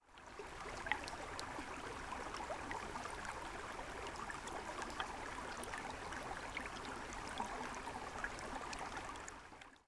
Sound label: rain runoff